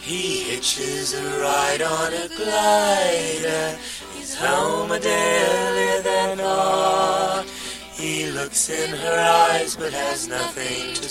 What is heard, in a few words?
Glider Vocals Short